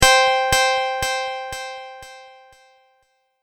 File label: Tap Play Delay, button; multimedia